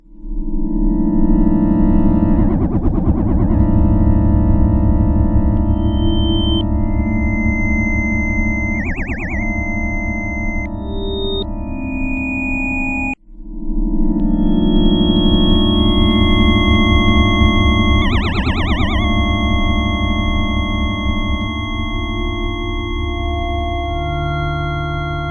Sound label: atmo, yamaha tx81z